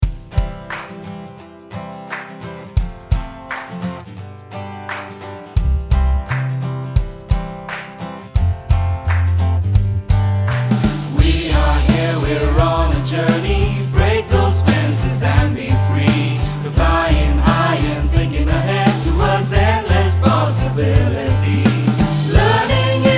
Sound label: Jingle; Green Books